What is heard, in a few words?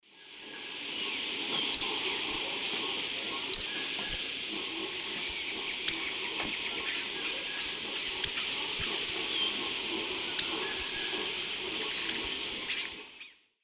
Euphonia chrysopasta, calls; S. America; Eastern Lowlands